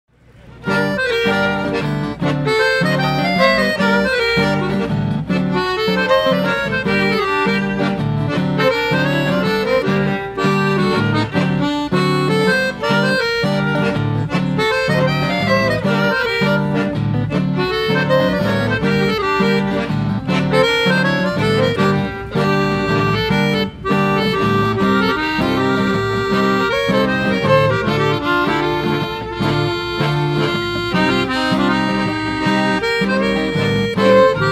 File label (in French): rythmes gitans